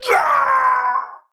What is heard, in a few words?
Death, Battle; Warframe; Tau; Dax; Knight